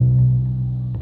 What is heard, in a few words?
whispy, synths; Notes